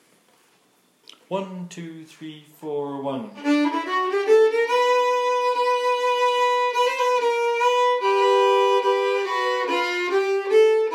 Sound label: violin